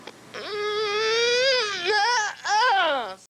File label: moan